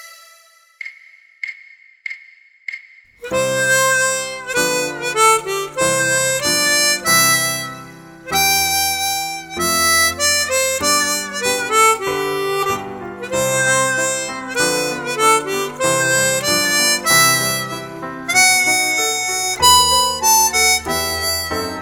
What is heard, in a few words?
Harmonica & Piano